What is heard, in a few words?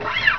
screw